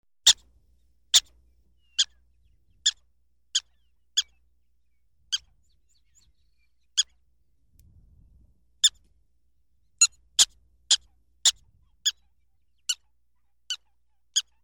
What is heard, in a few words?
Miscellaneous squeak